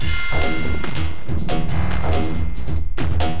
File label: Beat, Industrial